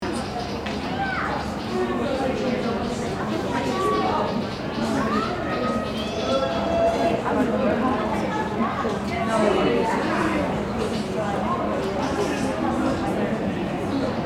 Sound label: Busy classroom ambience student walla